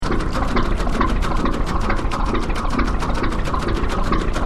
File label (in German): standgas